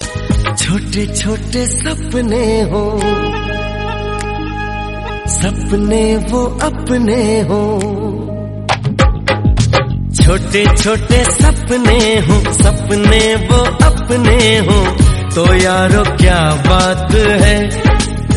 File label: Hindi beautiful song Ringtone